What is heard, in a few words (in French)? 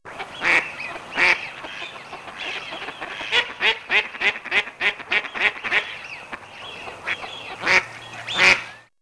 colvert, chants